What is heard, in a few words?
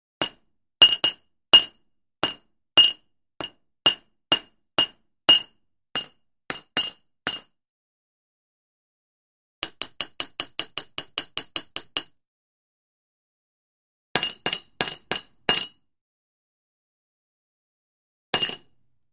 Anvil Hits 3 Metallic